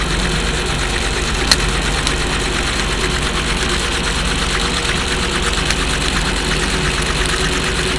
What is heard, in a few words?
passing yacht motoring, Hydro; Noumea